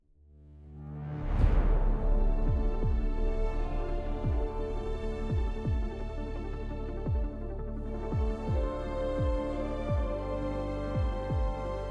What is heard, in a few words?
music inqueue loop; cherry